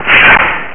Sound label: SWORD DRAWN